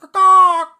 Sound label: Ka Kah bird; SyrinScape; Syrinscape unplugged; Jungleplanet dinolaser battle